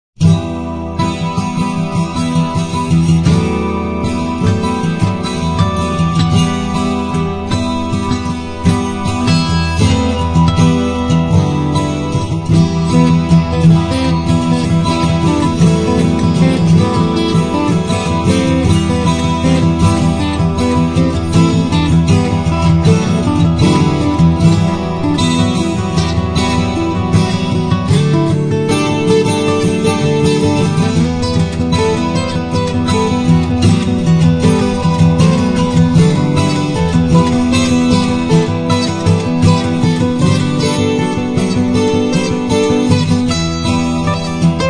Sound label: vail guitar